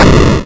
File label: 8bit explosion; gamerip; blastmorph pinball